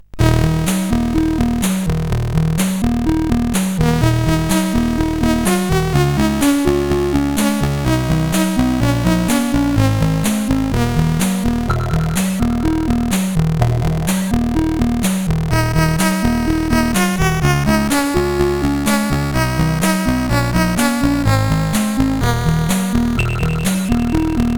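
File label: Gametune, MOS 8580 SID chip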